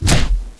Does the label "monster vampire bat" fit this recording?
No